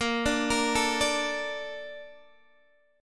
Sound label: Bb Major 7th, Bb, down, Guitar, DADGAD